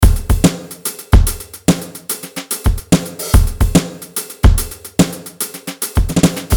Jazz 145 BPM; sample pack bundle

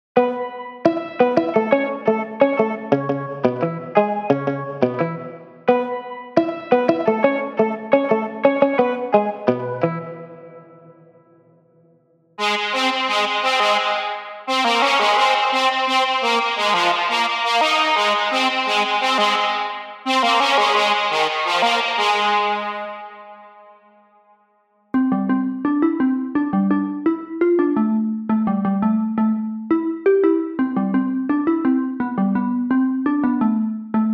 Melodies Reggae

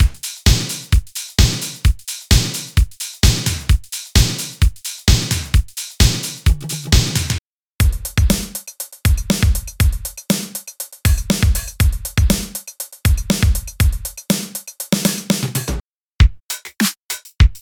Drum Synthwave Synth Pop; Drum Monkey; Unison MIDI Wizard Plug-In; Drum Demo

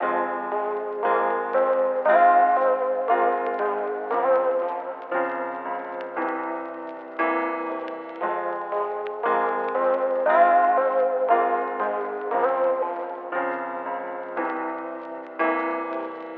Melody

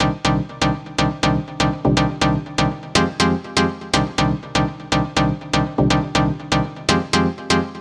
122 BPM Cm

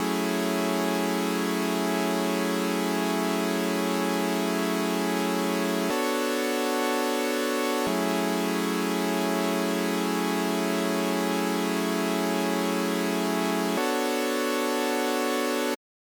Chords Dreamy Before

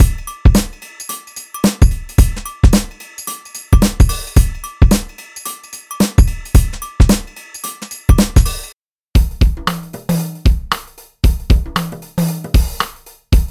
Drum Funk; Drum Monkey; Unison MIDI Wizard Plug-In; Drum Demo

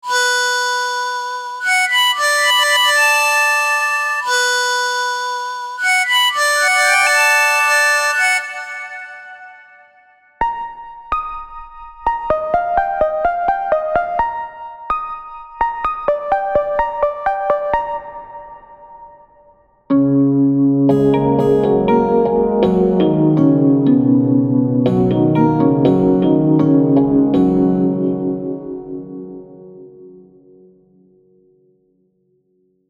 Melodies Folk

